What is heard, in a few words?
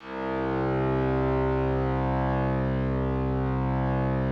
C1, STRINGS, Yamaha VP-1; Music Store; JODYSTRINGS